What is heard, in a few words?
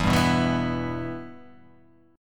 E-Minor; 12,10,9,9,11,11,x,x